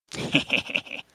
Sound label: haha